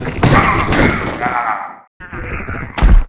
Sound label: Rock Die; Seer; GamigoNA